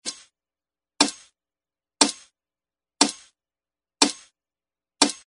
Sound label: tick tocks rattling